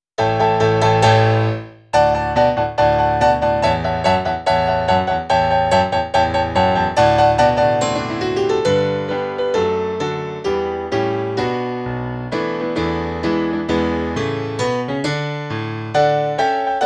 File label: Keys Only